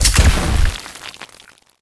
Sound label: gas blast